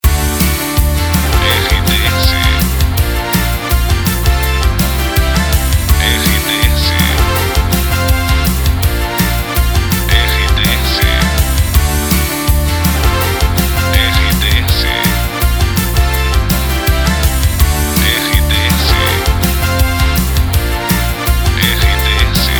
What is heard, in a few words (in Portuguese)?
TRILHA PARA LOCUCAO WEB NET POPULAR